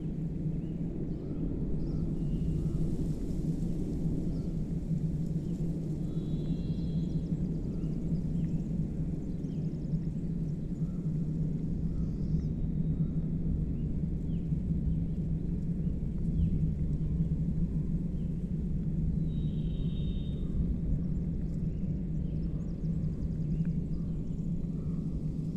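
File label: ambience brunch canyon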